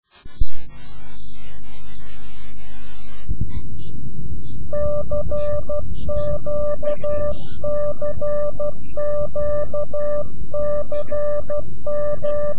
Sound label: CQ QSO, Morse CW; CQ Call